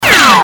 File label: evil pain; CAC ASSETS